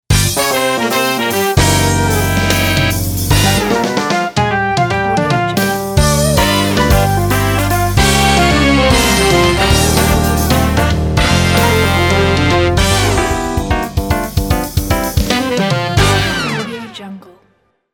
jazz logo

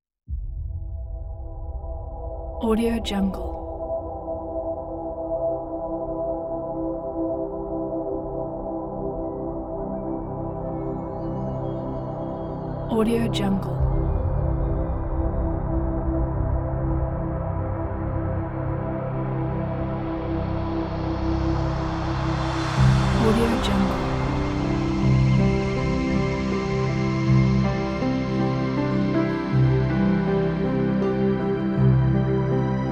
cinematic soundscape

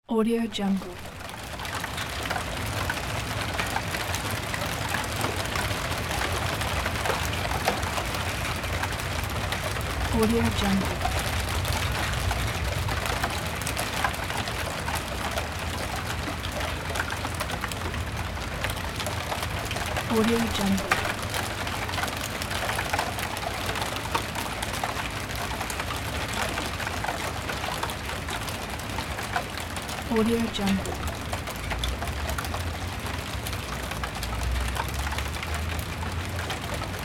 city light rain at night

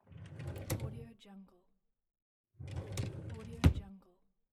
drawer

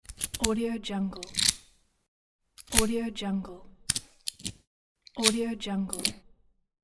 key insert

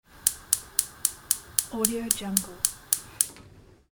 gas cooker ignited

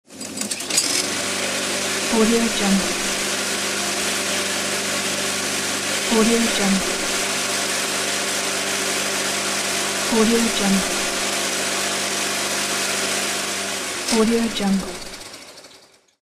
lawn mower; hasi007

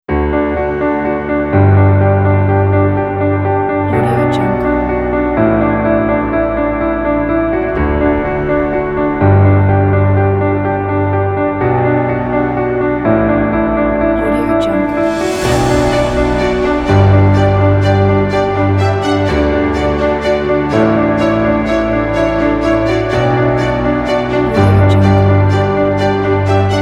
epic and inspirational

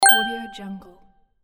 twinkle bell

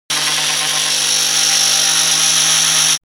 construction machine saw compact on load

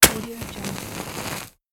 lighting match; fx-library

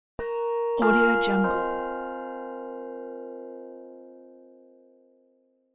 retro doorbell

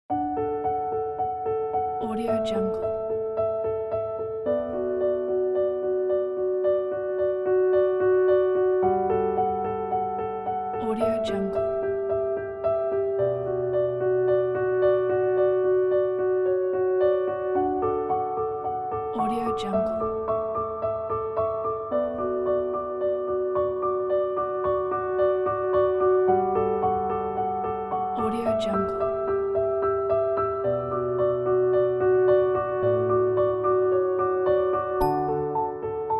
heart broken piano

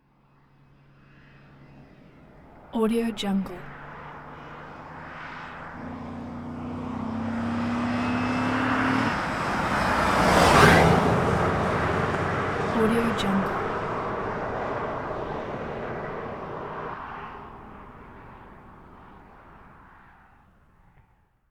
1997 dodge intrepid; prosoundfx

